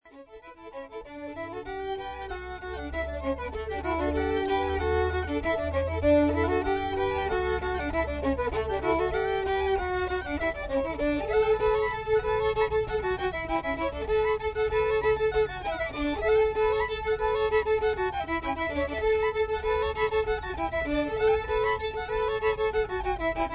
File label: la danse, fiddle